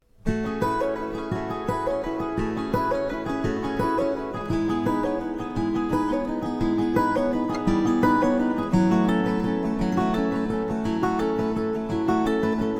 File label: acoustic instrumental